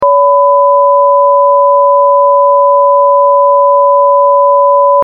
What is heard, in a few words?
432Hz Binaural 1000Hz Left 568Hz Right